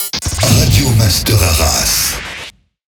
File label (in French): Sweeper SLOW; Radio Master Arras; Habillage